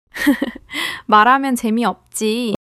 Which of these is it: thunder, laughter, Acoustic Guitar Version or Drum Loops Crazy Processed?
laughter